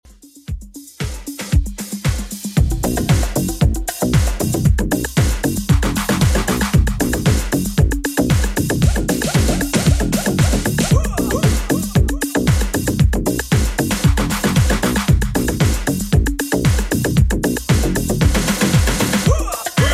80s Dancemix Redrum 115bpm

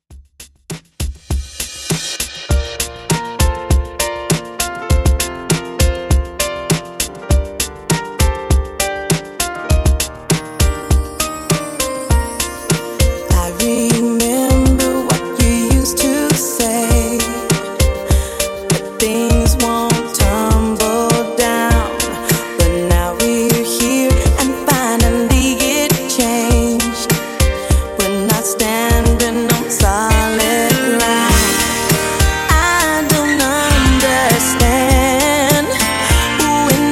Extended Mix, 100Bpm

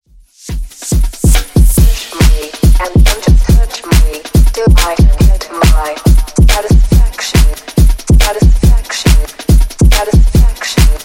Dj Intro Outro Edit Clean 140Bpm

